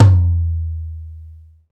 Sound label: TOM LO, Roland; Club-50 - Foundations Roland; Naturals A